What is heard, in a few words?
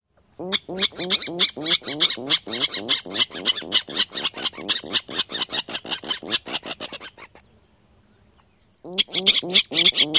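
grunt duets, Virginia Rail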